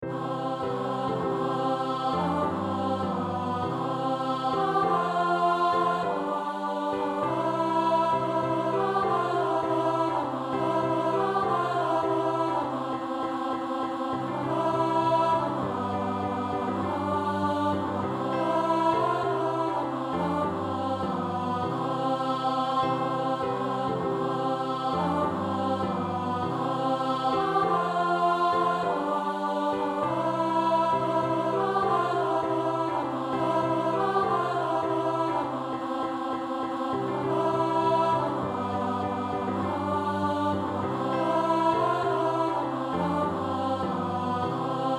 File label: Joy to the world Alto